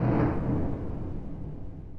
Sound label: Thunder